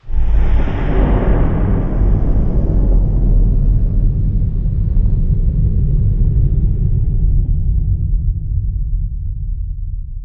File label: Fly By Earthquake